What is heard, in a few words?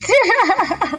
hehehe risada